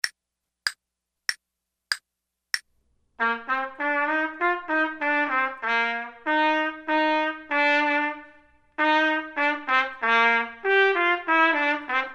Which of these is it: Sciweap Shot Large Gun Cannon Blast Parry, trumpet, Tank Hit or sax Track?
trumpet